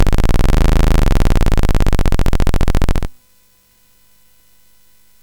CSG8580R5, GAMES; Street Rod

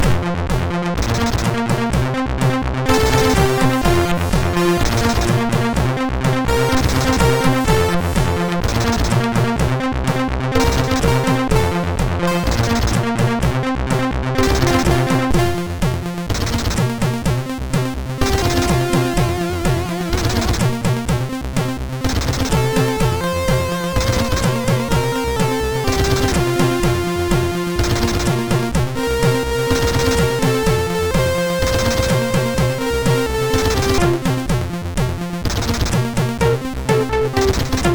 sid MOS6581

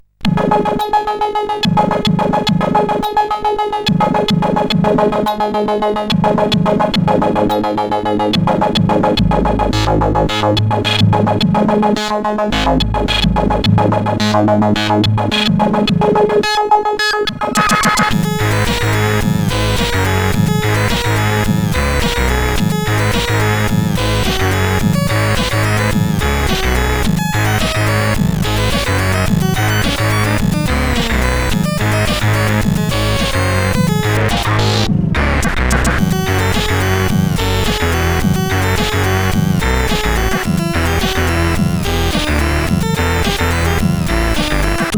CSG8580; Robooty

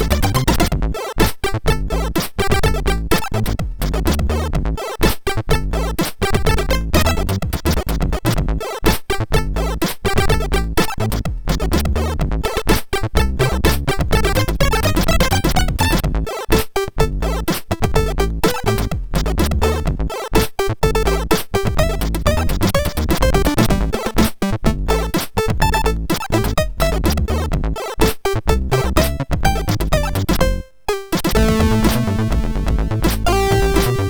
Shovel Funk, sid MOS6581R4

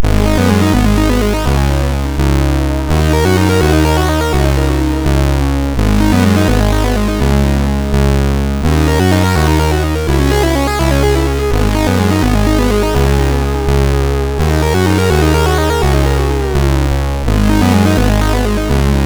Soft Love, sid MOS6581R2

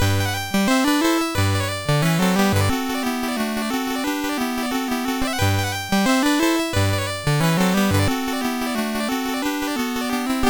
Russian Dance, MOS6581R3; sid

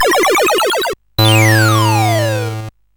Musicbox, sid CSG8580R5

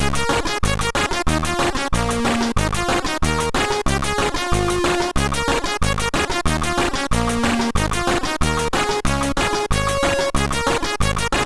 Ragtime; MOS6581R3

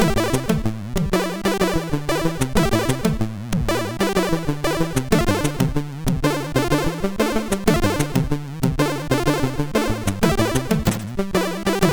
Jam, MOS6581R4; Tamme

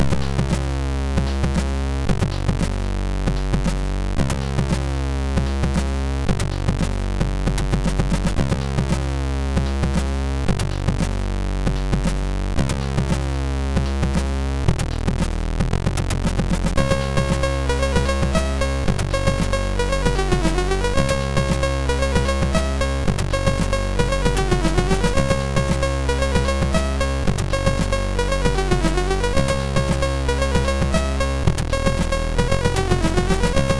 Blizzard part, MOS6581R3

